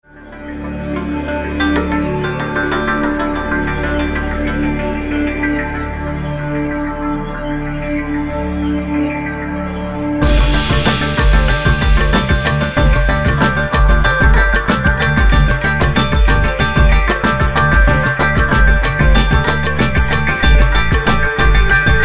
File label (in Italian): DANZA DEL RAJASTHAN; Etnik Coll. Vol.3